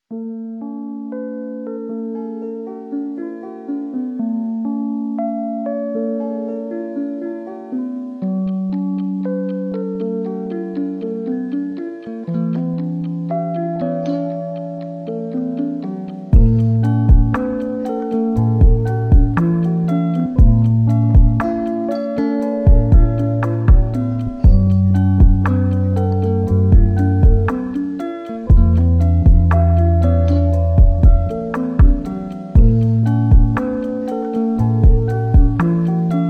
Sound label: lo fi hip hop